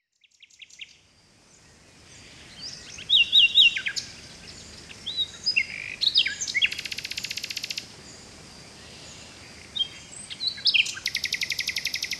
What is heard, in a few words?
Forest Bird Sounds